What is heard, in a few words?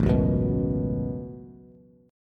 A 6th Add 9th A down Bass; A6add9; strummed